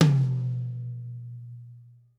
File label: Kit, breakcore Drum Hits